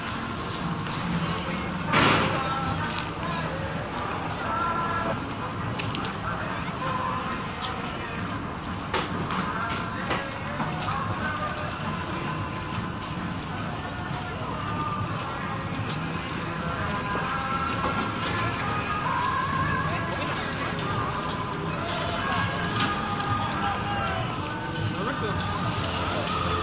atmosphere fireworks gun fx machines doors sound fx